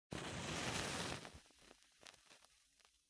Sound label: Match Flame